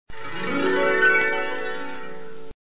Harp run

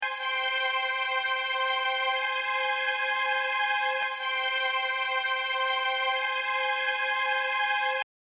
violins

